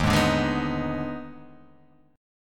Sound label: D sharp Major 7th Suspended 4th Sharp 5th, Guitar, Half Step Down